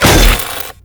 Staff Impact; Warframe; Grineer

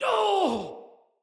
Pain, Soldier Male; Battle; Barks; Dialog; Dendrit

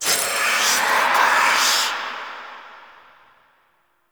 Dead End Secret Glyph Podium Activate; Ambience; Gameplay; Warframe; Entrati

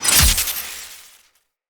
Melee Stance Change; Weapons

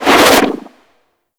Sentient Rifle Retract; Warframe; New War

